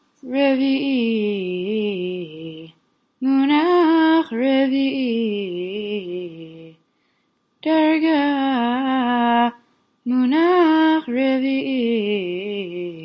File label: polish lithuanian eicha רביעי